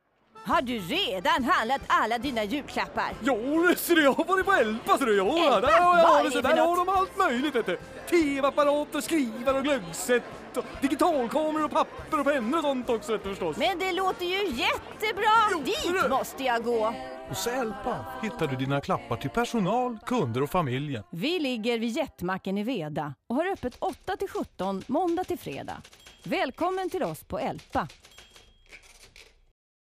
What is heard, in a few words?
jingle